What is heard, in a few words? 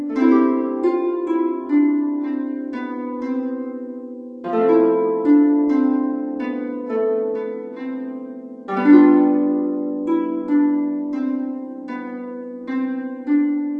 Concert Harp Room